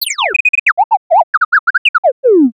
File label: Little Droid